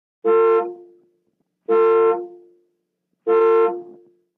60 Plym Int Car Horn

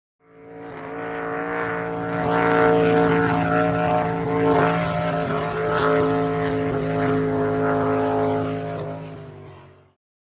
Drag Boat By Cu Race

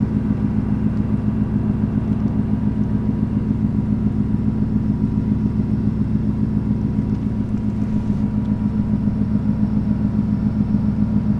Ship Stdy Loop

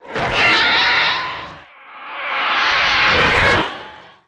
Animal Scream Animal, dinosaurs

